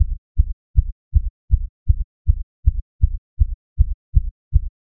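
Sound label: subliminal heartbeat pulse; throb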